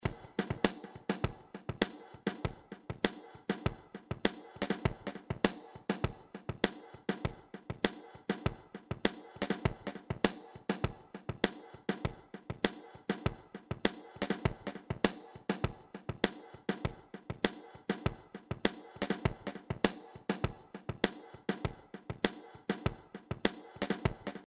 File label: 60s drums delay swing